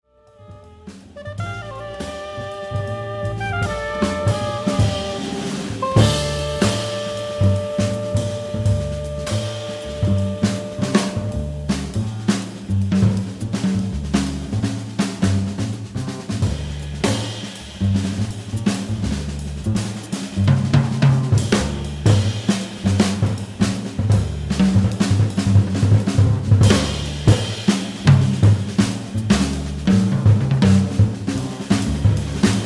drum; Dubai

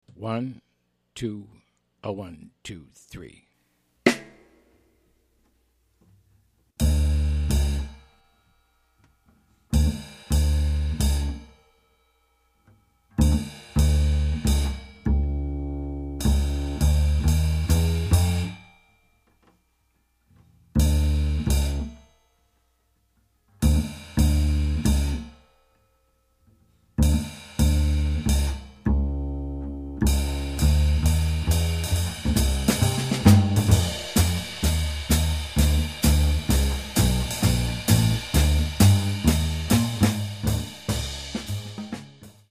Bass Drums only

